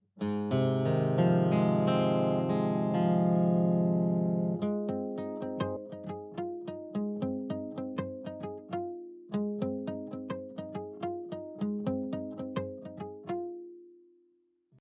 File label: open g sharp 6